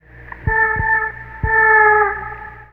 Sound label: Vocal FX; Sonic Foundry; Sony Creative Software; Stylus Pressure Urban Grooves on Digital Wax; What Up